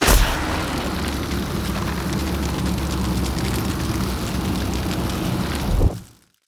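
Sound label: frost concentration loop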